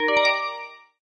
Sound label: Sfx - Combo; Farm Mania